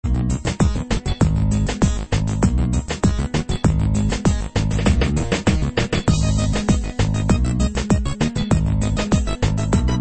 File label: Reggaeton